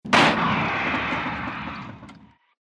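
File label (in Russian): звук лопнувшей шины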